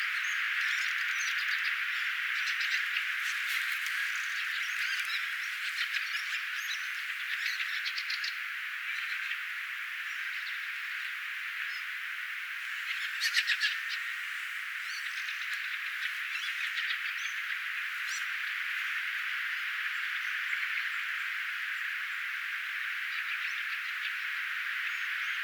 rastaita saaressa

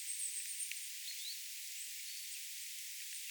olisiko talitiainen

